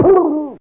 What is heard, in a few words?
Pdow